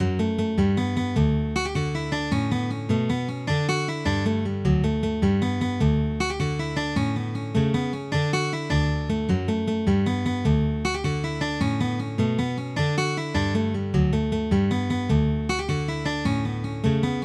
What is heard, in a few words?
Tripping up Stairs (2 part jig)